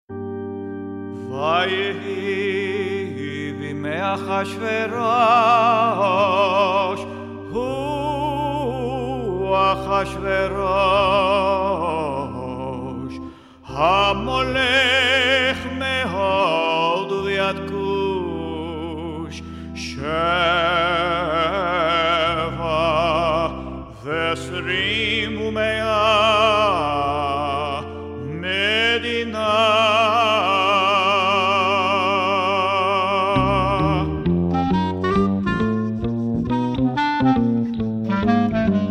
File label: Megilah Chant